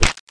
Impact; shogo; weapons; sniper rifle

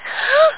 gasp!; calvin & hobbes

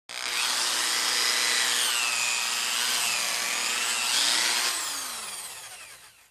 Drill

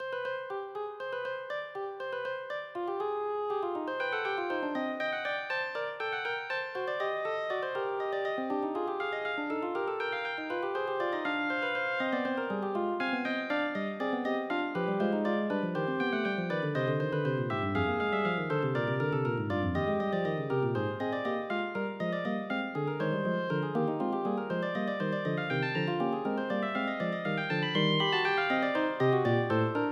FUGUE

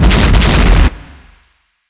Chain blast; ImpGal2; spacewar

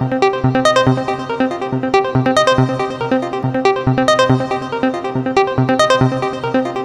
Techno, Melody; Techno Music Maker

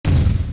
MISSILE

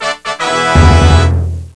Complete Level; BOOM

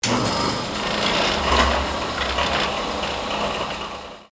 IND04, industrial; zones

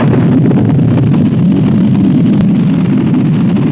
BING

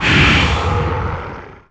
dino

